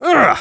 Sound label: Spy taunt exert